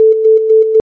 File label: volume rect 4hz